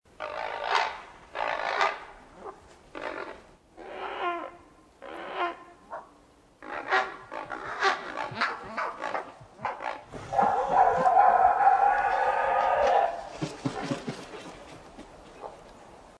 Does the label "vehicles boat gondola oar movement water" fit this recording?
No